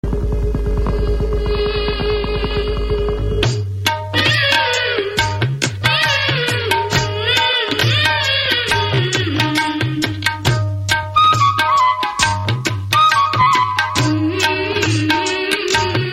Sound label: Tabla With Lady